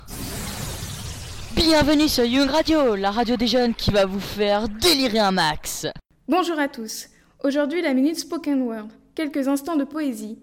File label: trio calypso